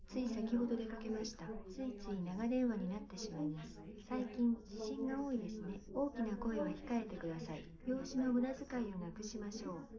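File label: female, live recording 250ms 5cm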